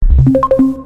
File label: hit